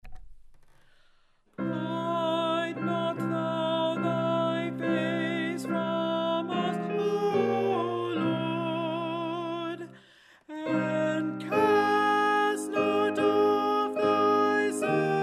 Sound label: Alto; Hide Not Thou Thy Face from Us, D. Farrant; Lent